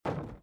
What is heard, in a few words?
SFX build wood; Out of Reach: Treasure Royale